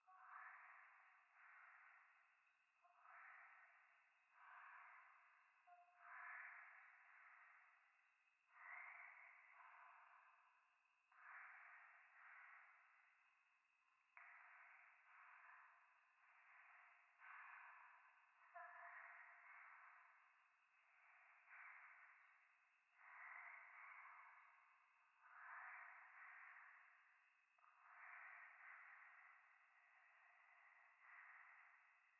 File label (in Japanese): 廃墟 右耳密着吐息 山奥夜